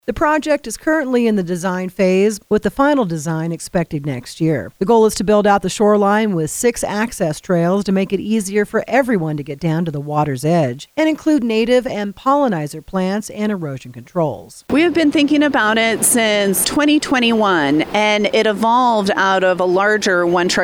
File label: rap